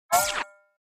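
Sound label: Electric Bounce Whip Wash, multimedia element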